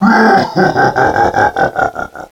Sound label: bwahahahahaha; DS; ML BOWSERS INSIDE